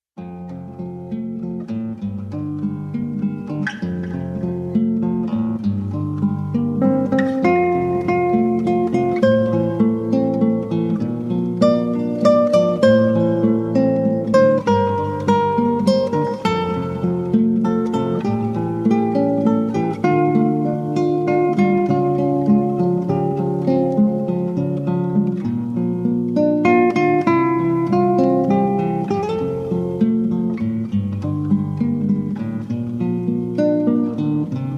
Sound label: Instrumental